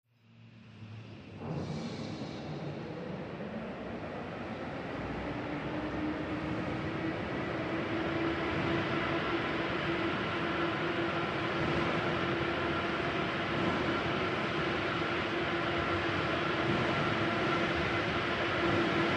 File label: Crane Motor Movements, Lifting Object, Distant To Cu Perspective, W Clanks And Machine Whir.